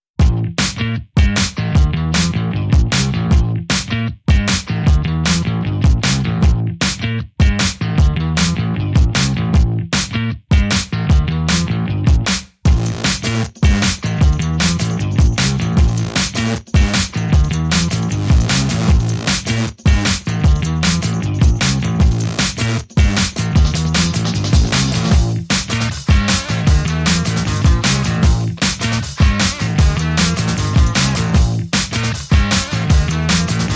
No Lead Brass Mix

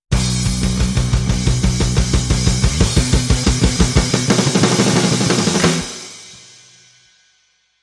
Keyboard, Sting

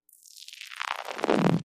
Thin Grit Sweep

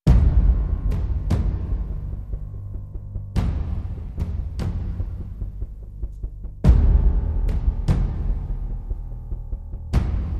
No Piano

